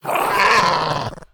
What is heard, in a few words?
Diremole Attack